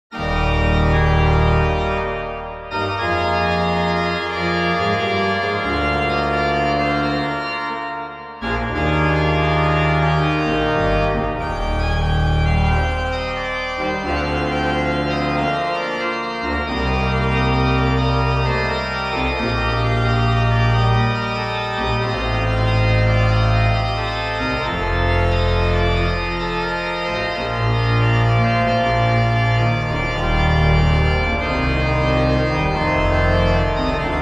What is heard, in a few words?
Bach Prelude E-flat major BWV 552